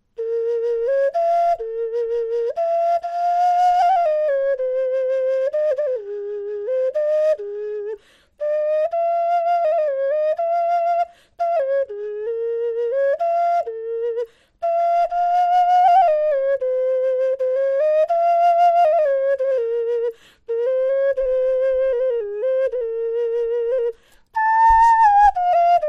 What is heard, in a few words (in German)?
Horn melodie